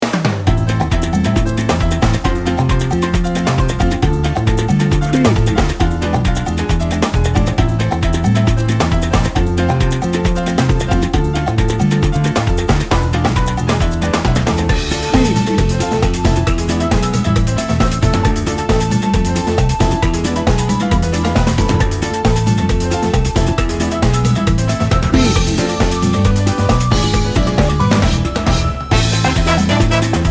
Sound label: latin party